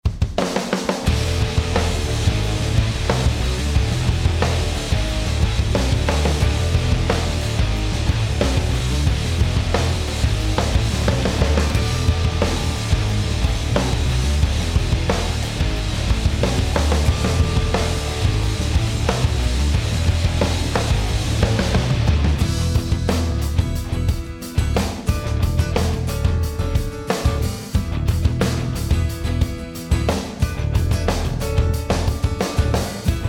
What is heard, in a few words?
Instrumental